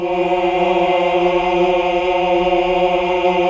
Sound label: AAH; Best Service Gigapack 1